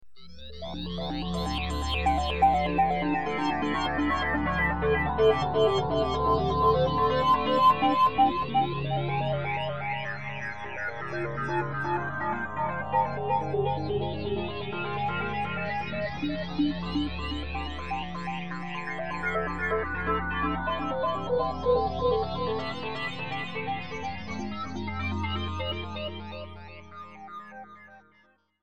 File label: ambient